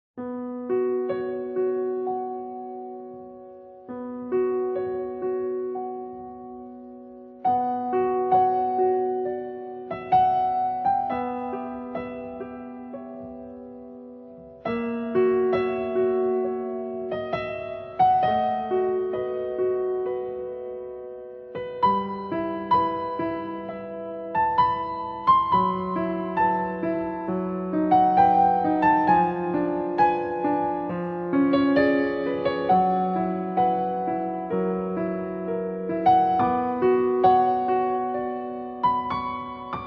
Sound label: Piano Solo; Fullmetal Alchemist Brotherhood OST 2; emo